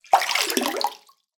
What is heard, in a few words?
water basin bathroom movement; zapsplat